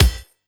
Kick, Trap

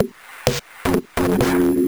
VFX2 Loop 128 BPM

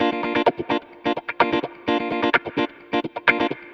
Electric Guitar Kit A# maj, 128BPM; Vengeance Samples; Special Sounds

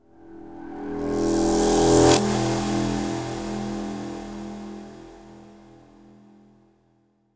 Tonal FX E

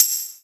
Open Hihat; Vengeance Samples; VEE Cymbals

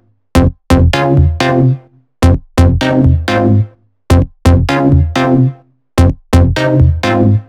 Melody Kits 128BPM Root F#; Vengeance Samples

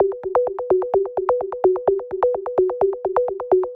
128BPM Melody; Vengeance Samples; Wasa Kit

